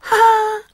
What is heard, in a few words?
ha ha, voice; ooh ahh